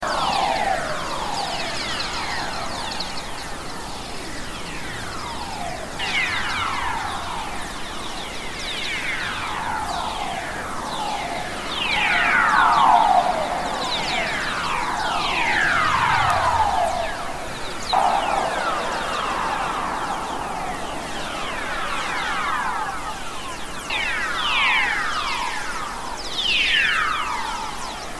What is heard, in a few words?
Whistler Waves